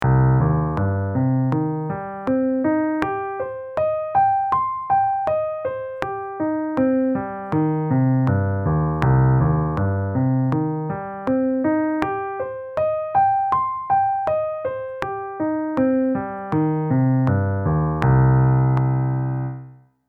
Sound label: with metronome